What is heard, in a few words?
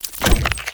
Crystal Mantis Fast Attack; Blind Quest - The Frost Demon